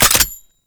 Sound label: Gunbrella Low Clip Mech; Weapons; Warframe; Tenno; Koumei